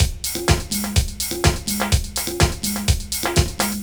DEEPHOUSE BEATS 125

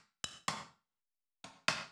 bottle, ethno, 125

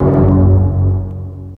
DEEP HIT; JUST FOR FX

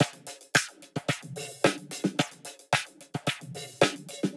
110 Cosmic Disco Mixer, Warped Live Drums; Loops; Touch Loops; CosmicFunk

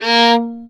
A#2, Violin Marcato; Orchestral; DirectWave; Varazdin Orchestral

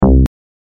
BASS

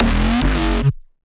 Bass

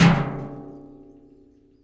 hit, rust kit; Nucleus Soundlab; Ambient Drums

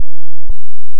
Sine Dist; Shapes

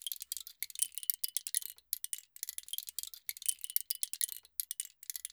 ETHNO EXTRA 90; ethno2

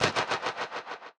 Snare, One Shots, Drums

